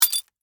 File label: Crash, Glass, Indicator, Lamp, Crack, Under, Pressure